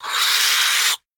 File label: biter roar medium; factorio; Factorio 0.14.21; creatures